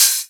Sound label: Open HH, Cymbals